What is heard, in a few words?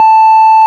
ORG, Farfisa Clarinet